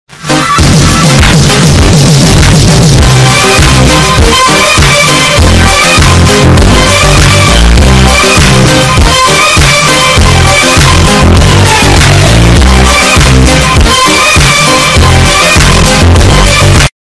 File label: Earrape Ringtone